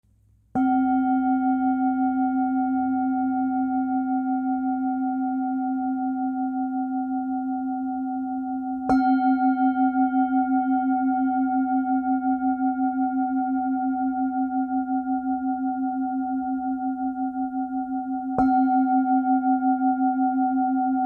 Tibetan C 10, tibetan singing bowls; hand hammered tibetan singing bowl note c

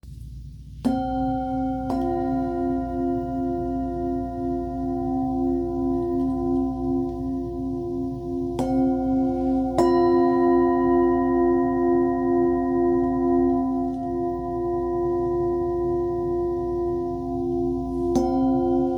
Chalice c f, tibetan singing bowls; double chalice tibetan singing bowls

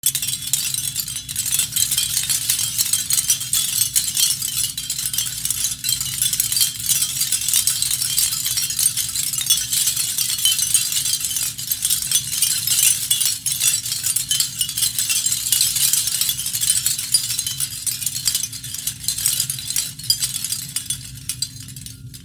Seashell chime

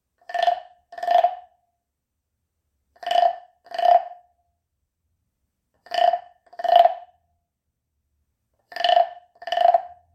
Big frog, frog sound instruments; healing instruments

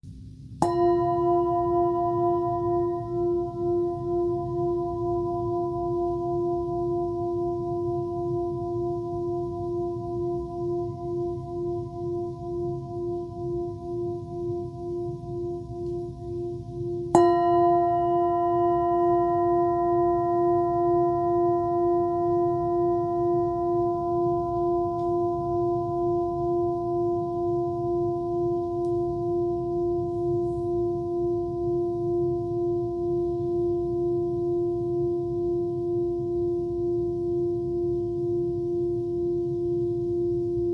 Tibetan handheld rig F, tibetan singing bowls; hand hammered tibetan singing bowl with handle

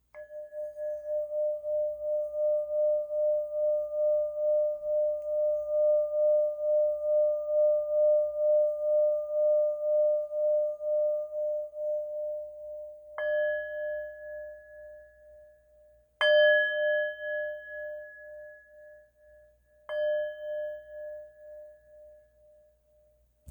Small machine tibetan, tibetan singing bowls; tibetan singing bowl for beginners meditation and mindfulness

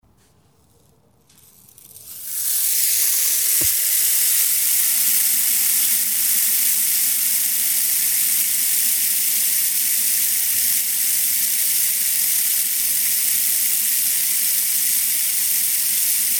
Rainstick

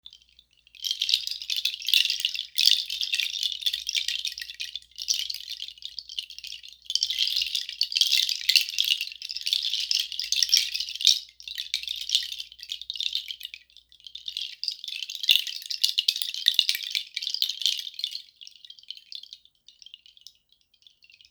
Lotus chime; handcrafted bamboo lotus canari seed wall hanging bali wellness decor

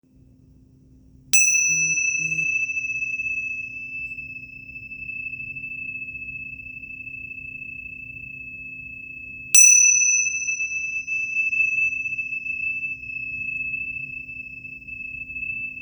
Tingsha; meditation tingsha bells with carrying case; tibetan singing bowls

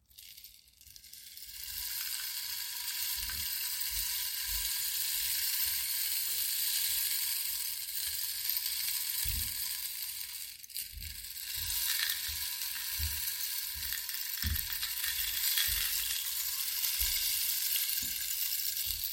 Bamboo rain stick

